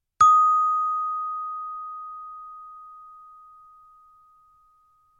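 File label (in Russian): звонок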